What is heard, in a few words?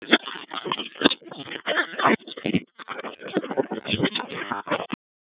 apco25